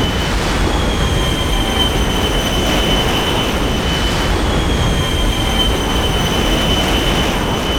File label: flight loop, flyer; Quake 4 Alpha; id software; strogg